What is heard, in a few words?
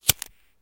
Bic Lighter Flick